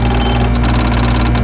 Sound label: comp loop, ambience; poke646